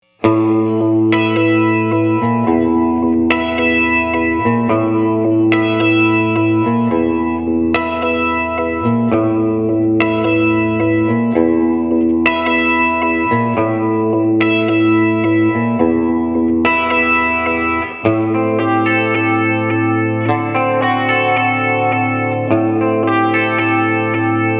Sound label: rampdown